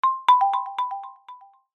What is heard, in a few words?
multimedia alert system